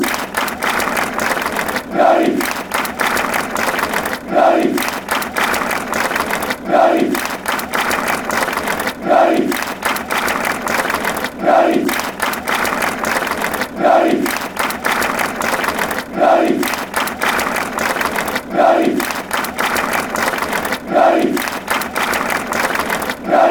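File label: claris, football chants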